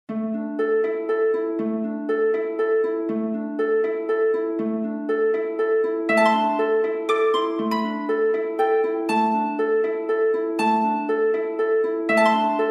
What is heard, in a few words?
travel harp